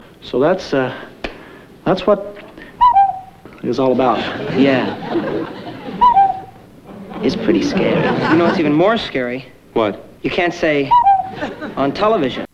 cuckoo

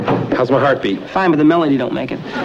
heartbeat

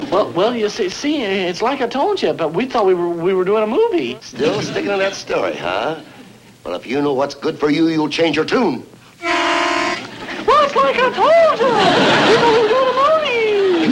tune

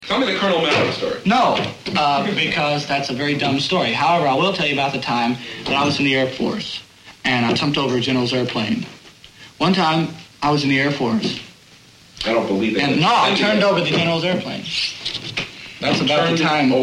airplane

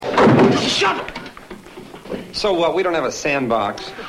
shovel

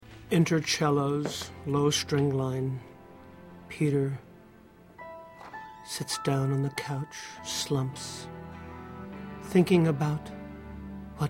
enter cellos low

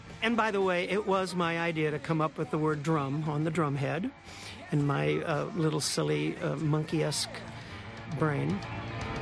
drum; Head (Davy, Micky, Mike, Peter Commentary); Micky’s drum; it was my idea to come up with the word “DRUM” on the drum head

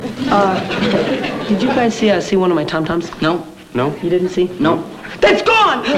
tomtoms

